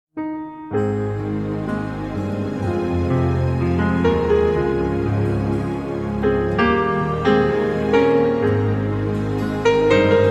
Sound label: brass minus